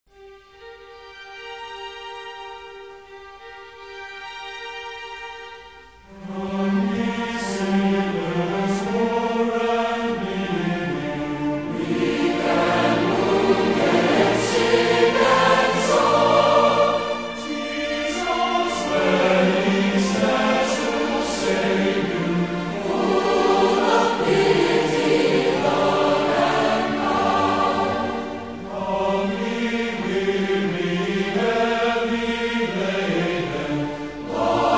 Philharmonic Orchestra Choir, I Will Arise and Go to Jesus Medley